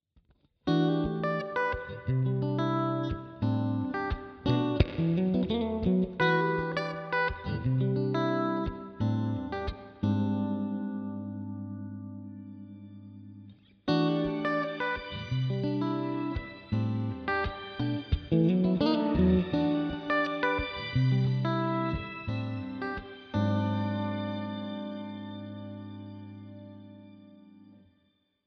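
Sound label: Shimmer V2 Riff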